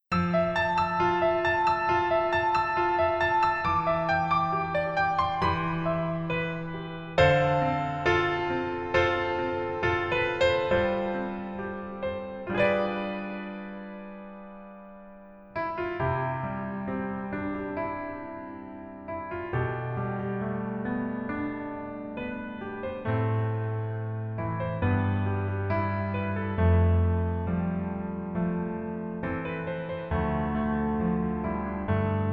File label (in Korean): Piano ver 멜로디MR 남자키